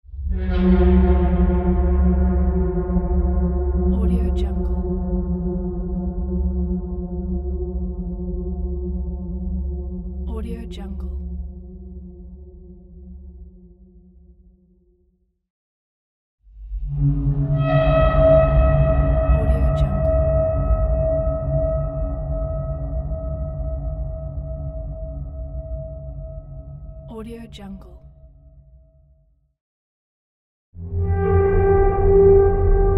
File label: halloween horror squeak noise pack